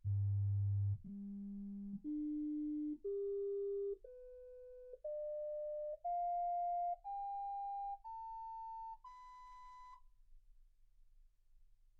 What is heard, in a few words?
sinus tones 48kHz